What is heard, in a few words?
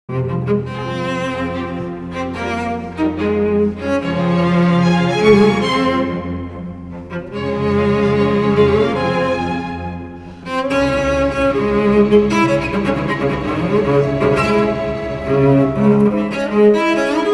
FIRST MOVEMENT